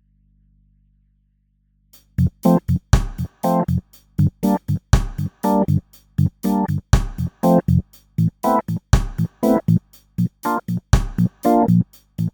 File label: reggae